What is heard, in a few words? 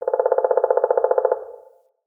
Tree Pine Pecker; Trees; Midrath Island; Ambience